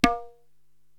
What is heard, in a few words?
Tabla Na, Korg TR-Rack